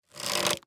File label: crossbow quick charge; minecraft